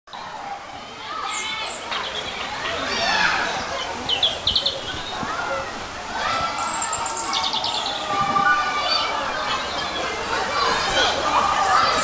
Outdoor Pool, Sound of people enjoying an outdoor swimming pool in summer.; Backgrounds